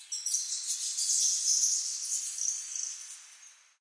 amb african birds; video games; ambient; nature; source engine; portal2

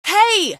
physimpact loud; episodic; episode 1; Source engine

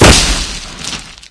land, antlion; Source Engine; video game; npc

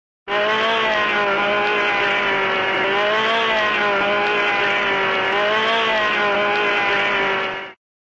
grinder grinding buzz; cartoon

